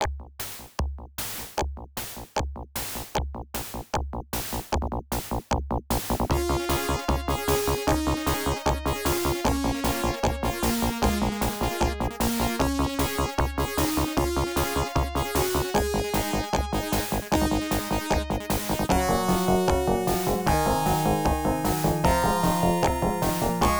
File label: Ending; blood bros.